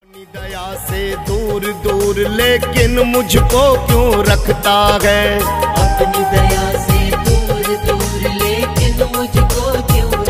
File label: New Khatu Shyam Bhajan Ringtone